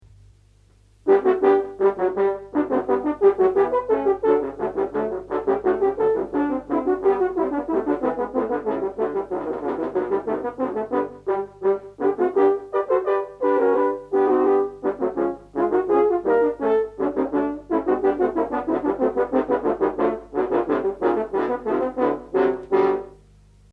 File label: Bach hornpipe